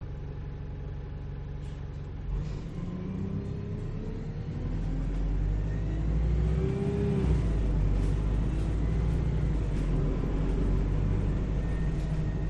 urban bus journey interior perspective newcastle upon tyne uk